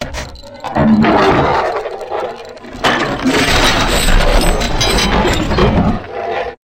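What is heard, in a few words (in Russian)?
биоробот органический робот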